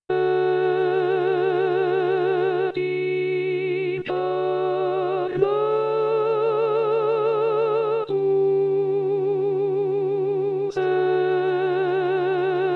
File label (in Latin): Et Incarnatus Est Contralti; Perosi; natale